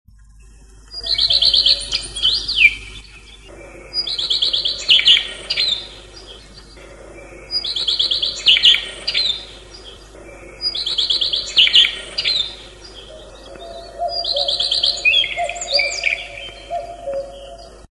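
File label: common redstart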